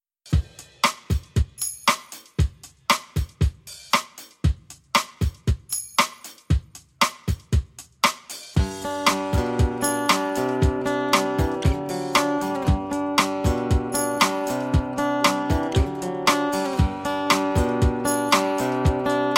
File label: acoustic vibes 432 hz